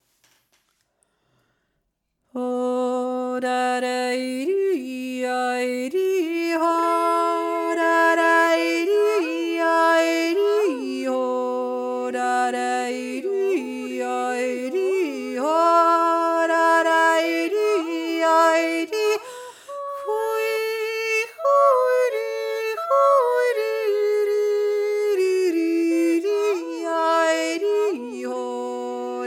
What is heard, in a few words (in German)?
stimme jodler